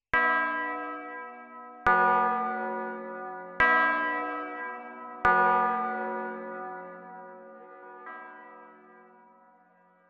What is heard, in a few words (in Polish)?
Dzwony